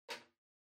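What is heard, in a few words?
Portable Stereo Far Remote Control Put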